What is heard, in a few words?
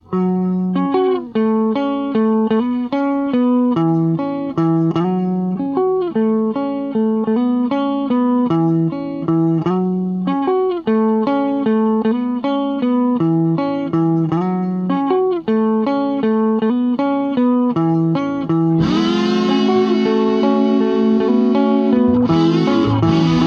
Riff Tapes